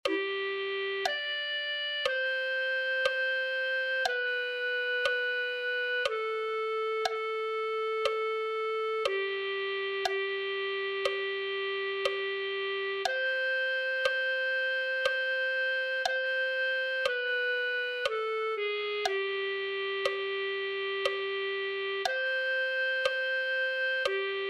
clarinet

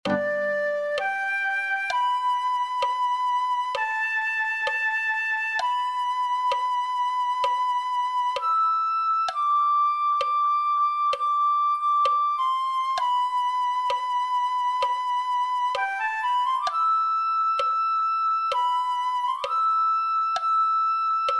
flute

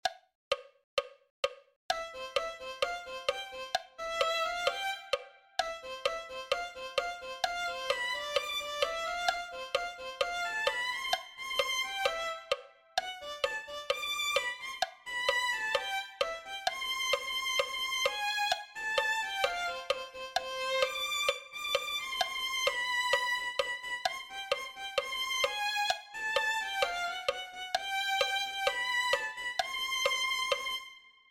violin

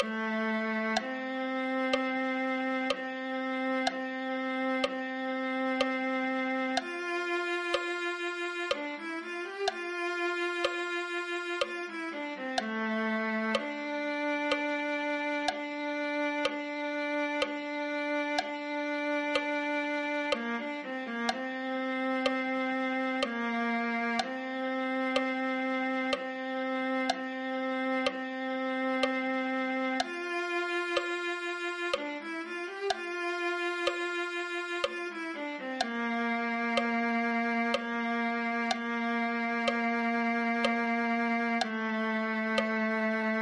viola

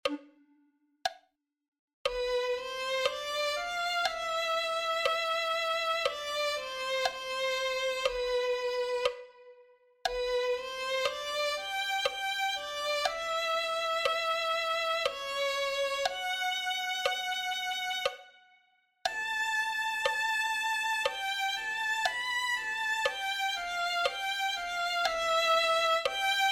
violin

